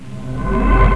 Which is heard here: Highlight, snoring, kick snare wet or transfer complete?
transfer complete